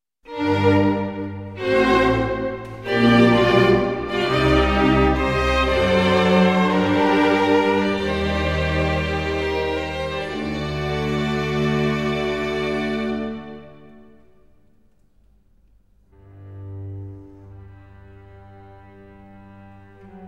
Concerto Grosso in D major

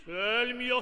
glisando; radames

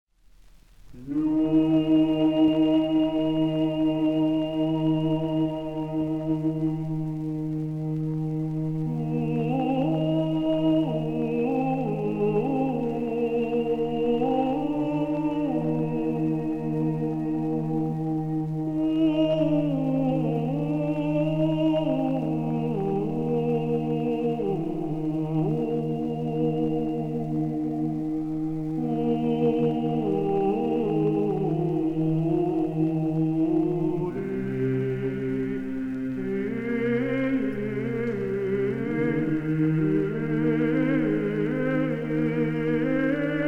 Organum duplum; Leoninus

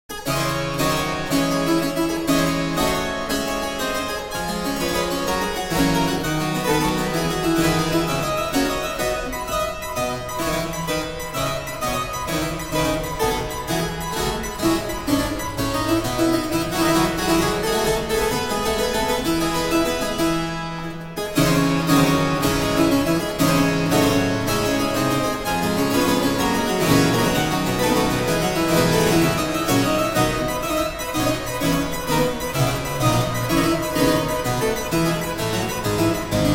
Praeludium & Fuga, Ouveture burlesque in D minor; Georg Philipp Telemann

